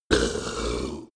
monster hit gurgle low; comedy-cartoon; soundpool

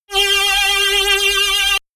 shot annoying tooth; zaps buzzes

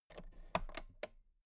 toolbox latch open soft; industrie wirtschaft; handwerk

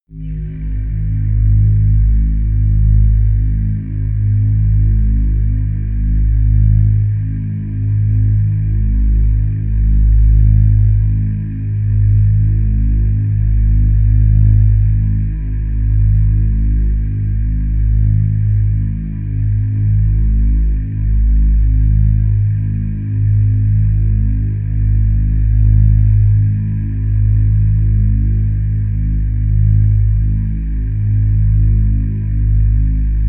drone hollow drone

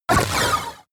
sci-fi laser shot short; krieg waffen

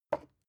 glass pick up clatter; objekte

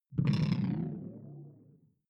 sci-fi alien big bionics; swooshes-swishes

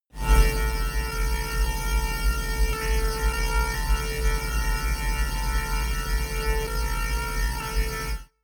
attune orchestra

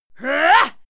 female fight attack hit

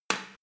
small drop indoors; handwerker; berufe

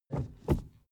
suv push forward gear across soft; verkehr transport; autos

